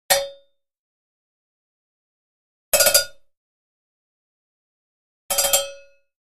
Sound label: Cow Bells 3 Cow Bell